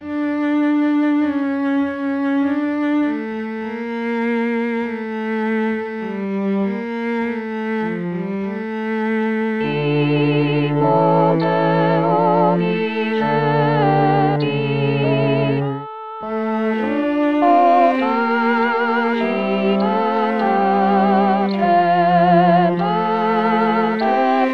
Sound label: oliveti soprano; 2023 st jean